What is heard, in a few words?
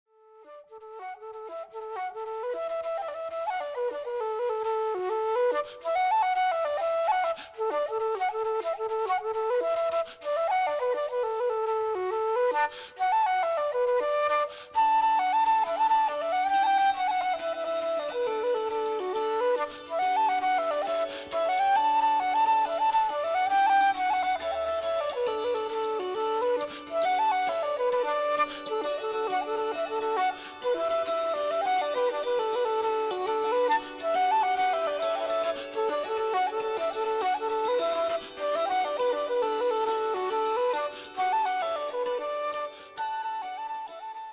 Jigs; A Song In The Aire; Calliope House; Blackthorn Stick